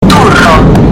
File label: button; turro